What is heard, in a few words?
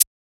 shaker one shot hard; Percs